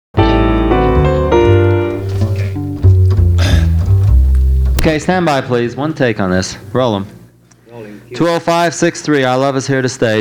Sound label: Music Box Version